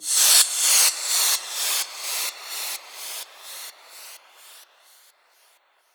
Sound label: FX Downlifter